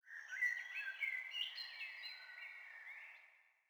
sting songbirds; Rust

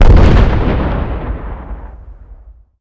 explosion underground low; Explosions

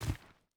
footstep burlap stones jump land; Rust

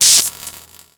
lock code shock; Rust

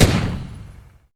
Automatic Rheinmetall Mk20 End, Weapons; DCS

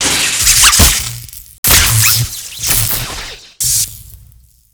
explosion television sparks; Explosions

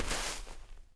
Foot Snow; Civ4 warlords

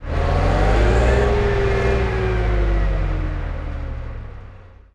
chieftain engine int load, engines tanks; War Thunder

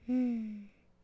Setlr Child Fidgt Vox; Civ4 warlords

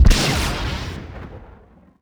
rocket ignition

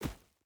footstep barefoot forest jump land; Rust

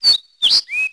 sparrow; ambient birds; World of Tanks Blitz

